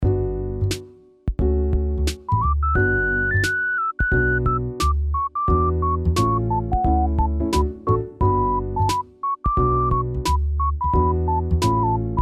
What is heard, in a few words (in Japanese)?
シンセ